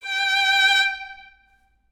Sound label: violin